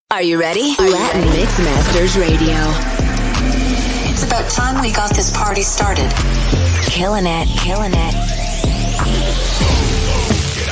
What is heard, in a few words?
GUARACHA MIX